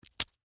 Masking Tape Peel, Industry, Masking, Tape, Peel, Off; Offices